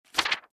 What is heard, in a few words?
Softcover book page turn